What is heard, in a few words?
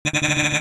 laugh noise; spamton; DELTARUNE